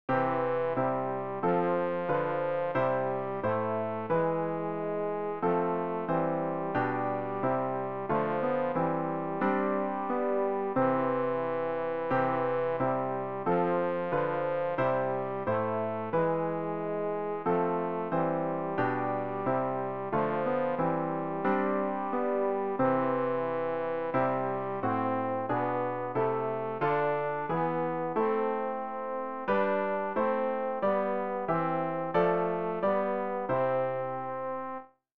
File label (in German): tenor; Ref. Gesangbuch; RG 482: Jesus lebt, mit ihm auch ich